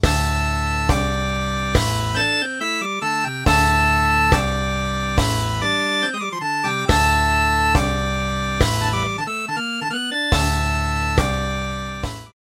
solar nebula organ

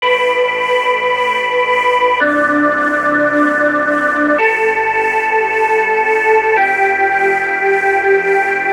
chord

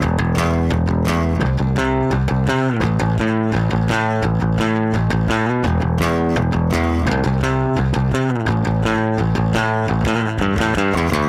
dry not effected

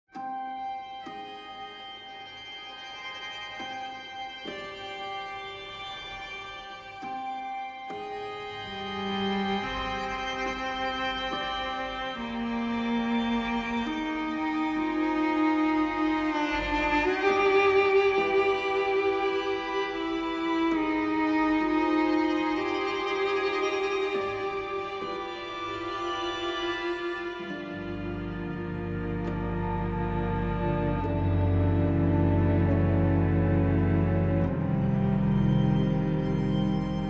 solo strings eerie

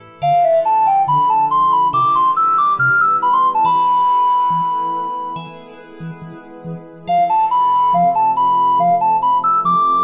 ocarina sweet potato

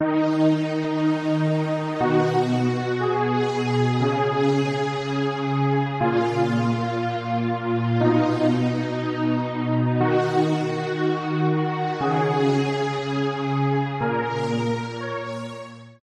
pad subtle formant

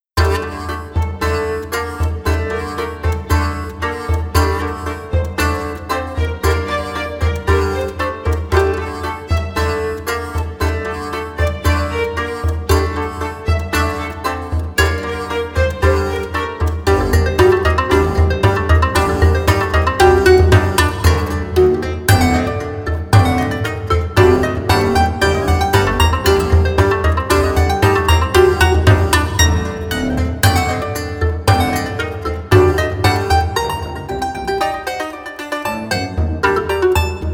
strumstick

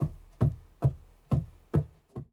foot steps carpet foley

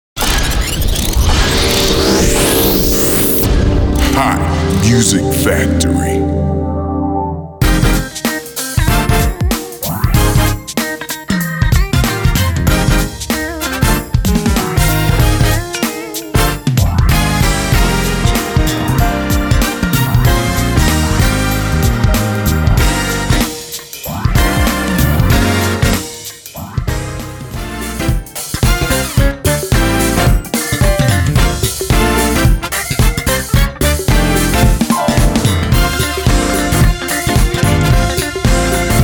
stellar gospel jamz

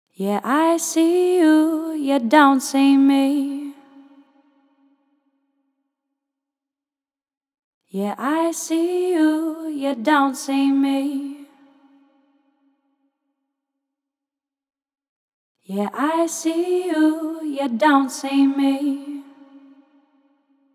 lead vox

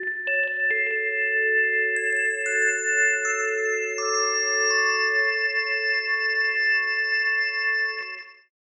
atmo sinusoidal dream

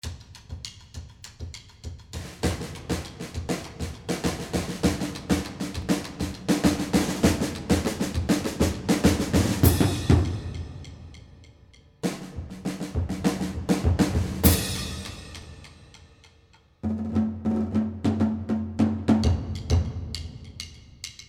drum

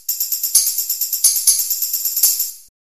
orchestral tambourine

